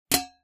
hit Helmet, Swords; Foley; Kenney's Sound Pack

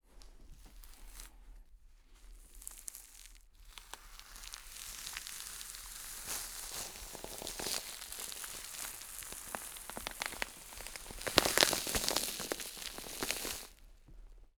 INT Full Skin pull, Deer; Coll Anderson

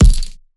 Punching Perc, Straight Glove (Chain); PROCESSED; 99Sounds; Punching Percussion

